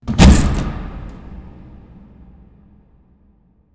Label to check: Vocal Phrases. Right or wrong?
Wrong